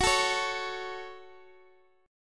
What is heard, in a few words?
F sharp Minor, F sharp, chord; chordshape; down